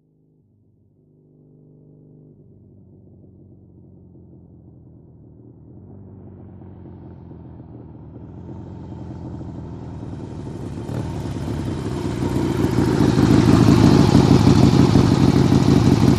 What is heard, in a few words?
Harley In stop off, harley davidson